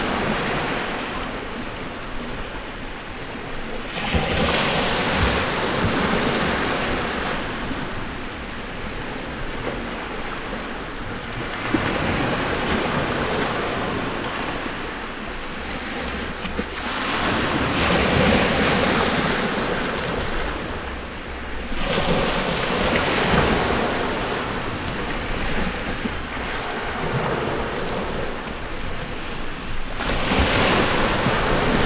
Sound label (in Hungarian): szikla melyedesbol 6 meterre a viztol, tenger; thassos 2016; metaliabeach